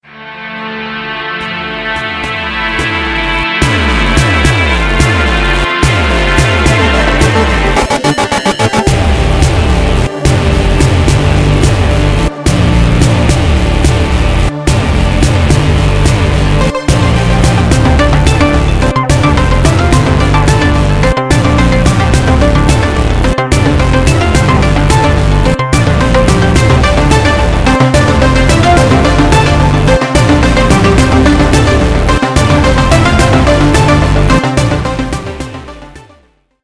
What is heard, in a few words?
Synth Rock